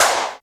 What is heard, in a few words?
Claps; Vengeance essential club sounds-3